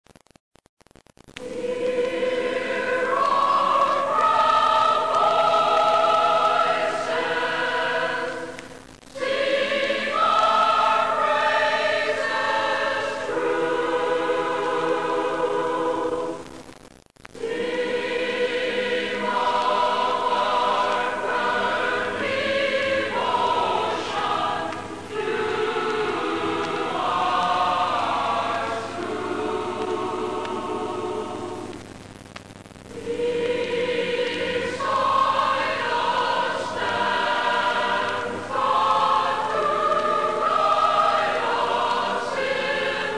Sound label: Alma Mater Choir